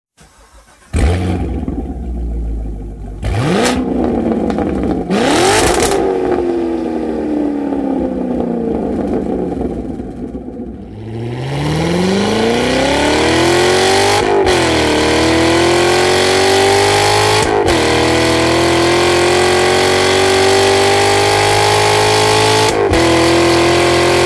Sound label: Sound Evolution Corvette Stingray C7